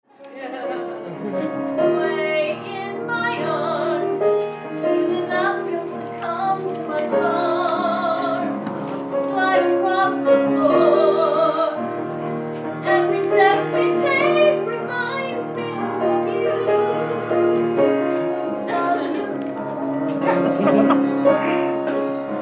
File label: Slow Song